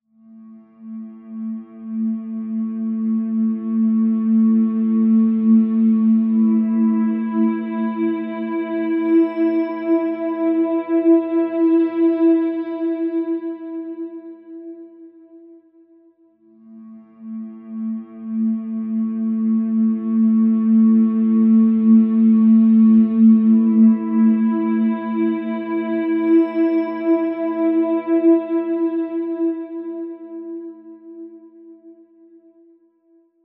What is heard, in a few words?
tones; Ebow Tone A; Kingdom Of Fantasy